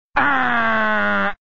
Buzzer; Jim Rome

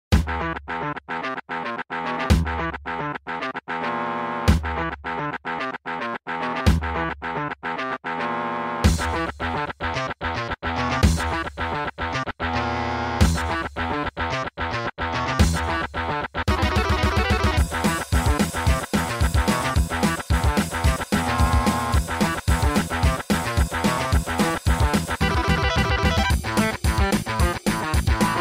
midi; Doom

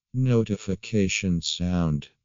Notification sound

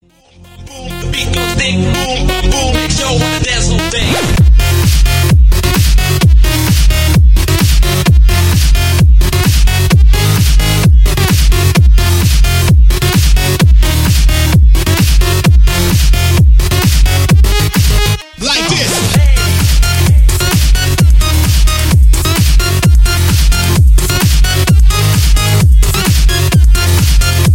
Electro

